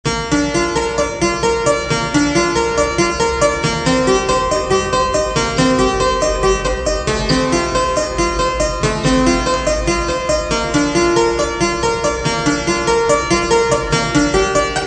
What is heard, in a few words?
Clavichord solo